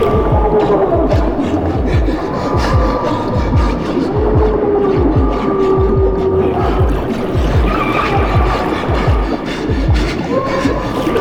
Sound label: Anchor Loop; Orbital Station; Warframe; Tau